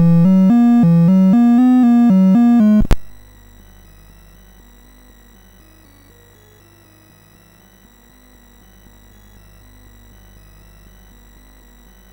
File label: Traffic Jam BASIC, MOS6581R4